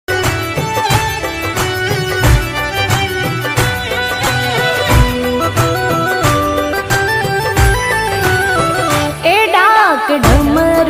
song

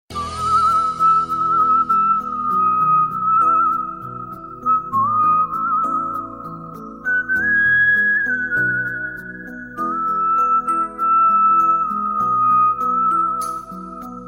whistle ringtone; titanic whistle

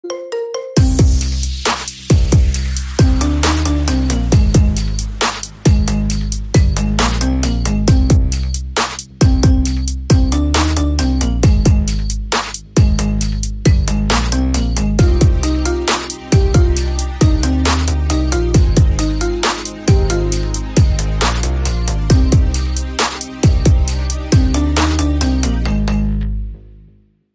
Marimba Remix

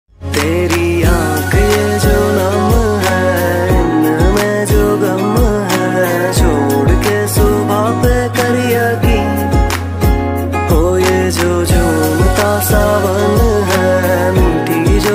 romantic song ringtone

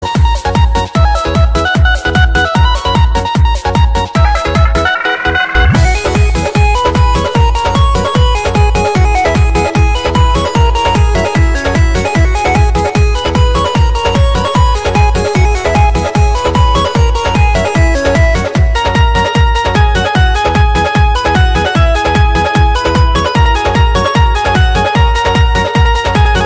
instrumental

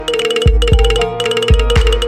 samsung s10 sms

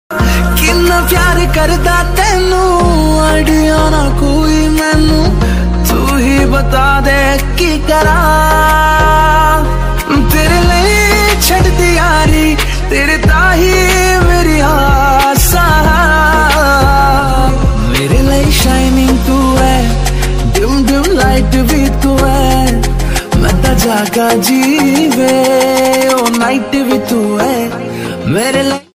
romantic song ringtone

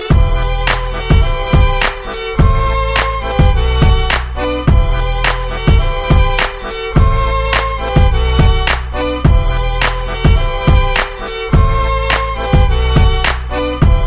relax violin

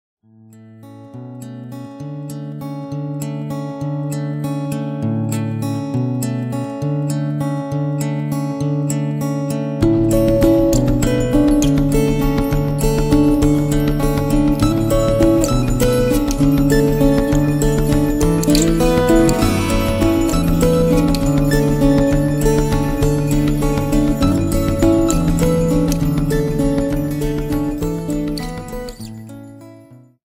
huawei ringtones

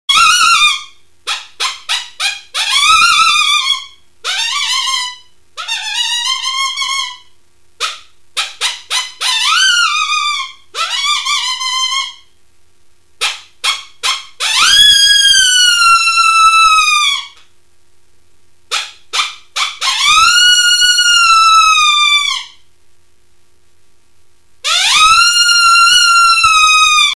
puppy sounds

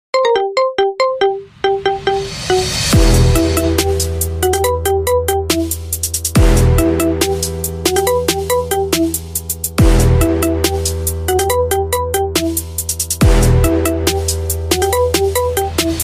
marimba remix

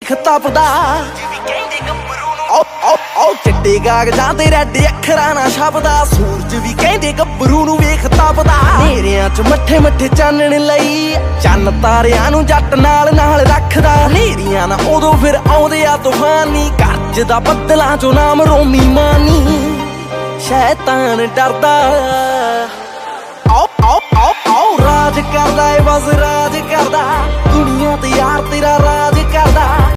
Punjabi

